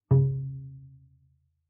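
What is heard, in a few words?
Bass pizzicato fortissimo sul A Db2, Double Bass; MIS Pitches - 2014; Strings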